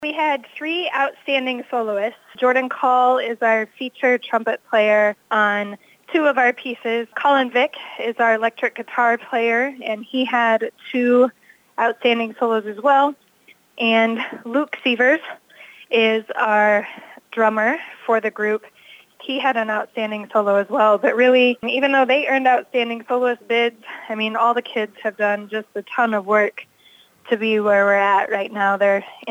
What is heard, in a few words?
Jazz band; HUMBOLDT, IA; Wildcats Jazz places 3rd at District jazz festival; 97.7 The Bolt; Humboldt High School Music Department's Wildcat Jazz